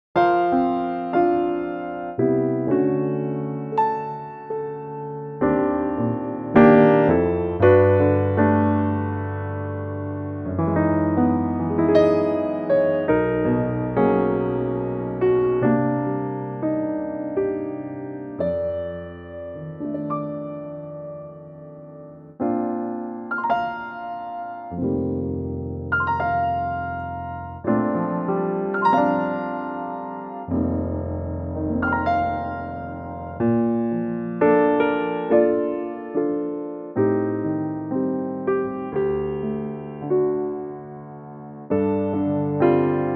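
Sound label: Bb piano track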